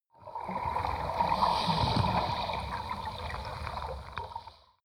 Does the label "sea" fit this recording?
Yes